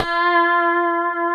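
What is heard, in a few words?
F#4C, compressed strat, guitars; Spectrasonics; Hans Zimmer Guitars VOL-1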